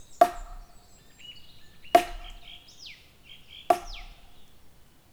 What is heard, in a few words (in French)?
3x Batons secs CLAIRS Legere Reverbe; Percu Bois